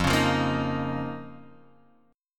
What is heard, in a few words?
D sharp 7th Suspended 2nd F, Guitar